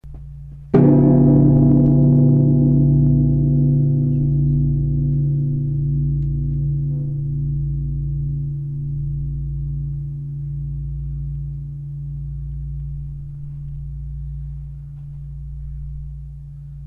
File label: 35000 kg, bells; Vera